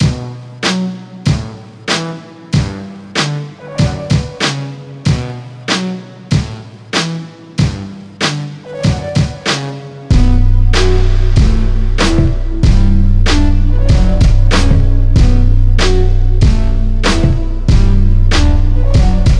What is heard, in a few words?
Dance Slow Mo